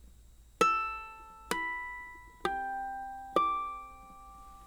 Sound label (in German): flageolett, ukulele; pono